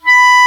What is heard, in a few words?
Clar mf B4; Digital Sound Factory Virtuoso